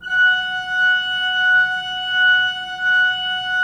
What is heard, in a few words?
Pipe Mix Celeste, 3D Organs